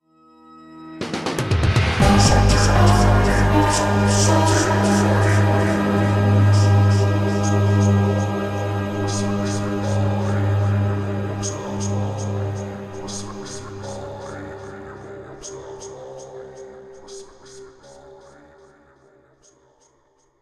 Music One Shot; gamerip; Zombie Army Trilogy; PS4

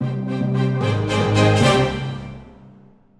Battle Start; World of Castles

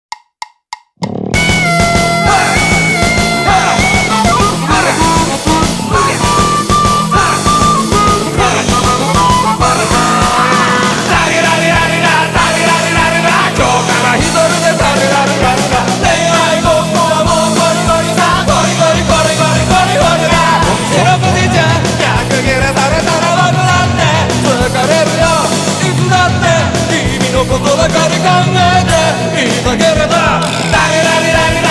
Guitar Minus Track

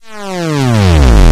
Fly

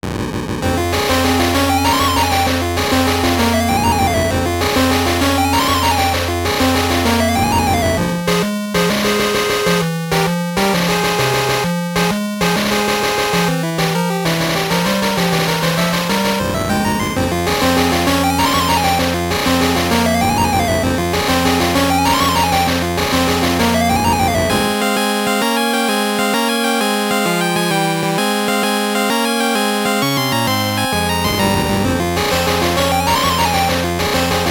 Boss Bossanova; gb

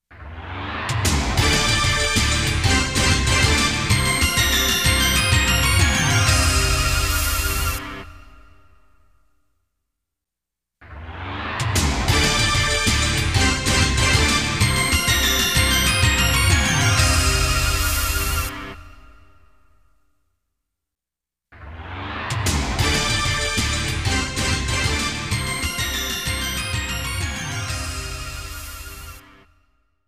Midi; Salamander Portable; PSP; Xexex

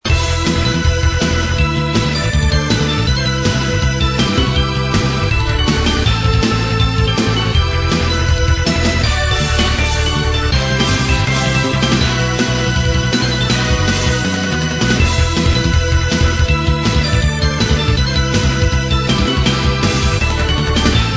mining battle; Adventure and Mining; Longcheng Ltd; Android

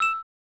Collect Gem

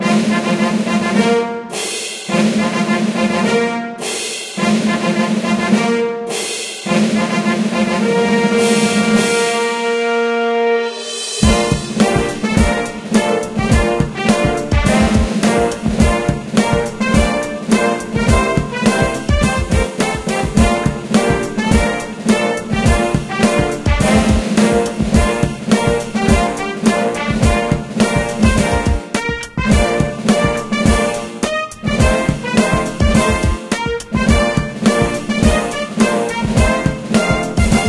Combat Low, gamerip

